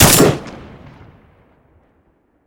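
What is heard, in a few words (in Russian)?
металлический лязг затвора пушки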